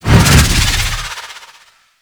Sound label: Storm Decap Mount; Warframe; Ninja